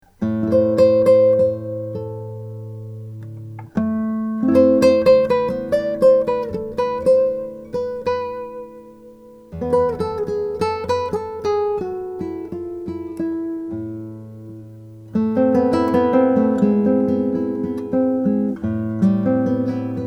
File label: hill guitar co la curva; french